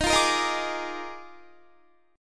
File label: Eb Major 7th Suspended 2nd Suspended 4th Eb Guitar 4F 4A 5C 5G 5C; EbM7sus2sus4; strummed